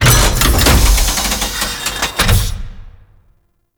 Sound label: Machine Open; Warframe; Quests; Entrati Labs; Cavia Rank Up Scenes